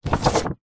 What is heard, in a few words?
hospital xray sheet pick up from surface